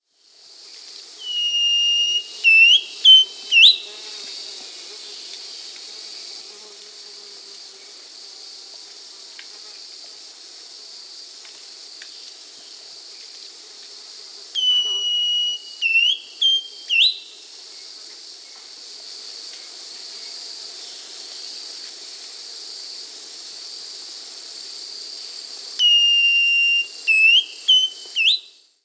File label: Thrush-like Schiffornis (Schiffornis turdinus); Peru; S. America